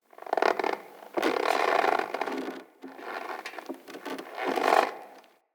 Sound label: Tent Rope Creak, Interior; Soul Plane